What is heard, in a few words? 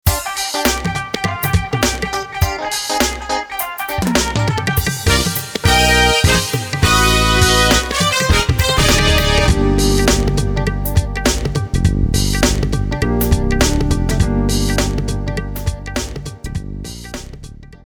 BUNGABI; pa80; exb pa1x